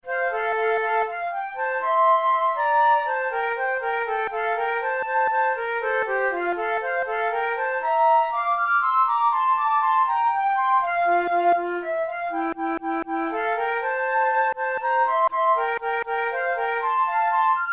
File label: camp song of the chicago irish brigade duet, flute